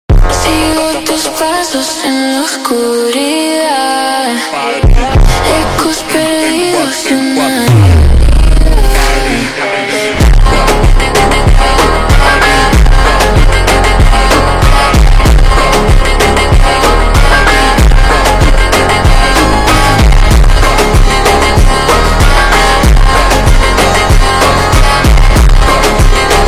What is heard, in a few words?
Slowed, فانک; funk